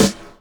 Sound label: SD, Dance Mega Drums; Best Service; SD-HIP